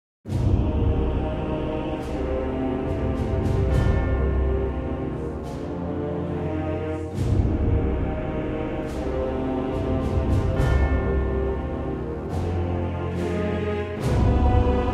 lose theme; warmachine tactics no man's land ost

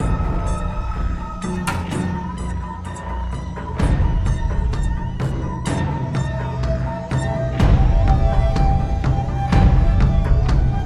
sounds music; Halo Combat Evolved; Xbox

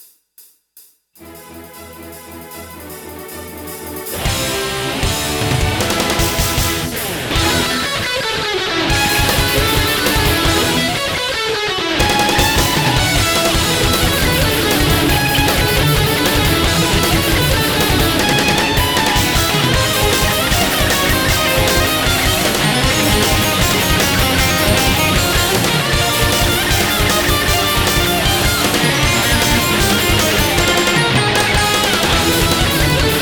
Bass Minus Track

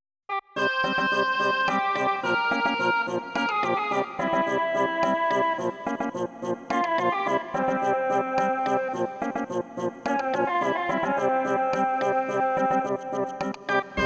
Persian Dance; hercules glory iv gift of the gods

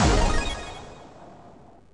charge step max; stardunk ios gamerip

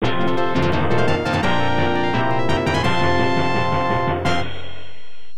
Stage Clear Fanfare; the king of dragons arcade

